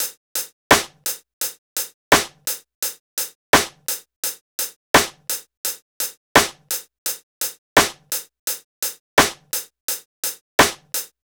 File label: Hiphop loop